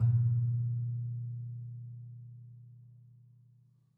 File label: Classical Guitar Marimba Chunks; Classical Guitar Extended Technique Stereo